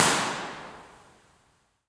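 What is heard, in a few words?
Winery, Impulse Response; Cedar Creek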